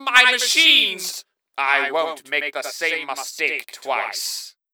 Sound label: MvM Wave Complete; Gray Mann